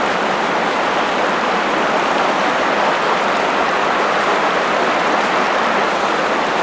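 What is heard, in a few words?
water river no bird distant loop; Call of Duty Modern Warfare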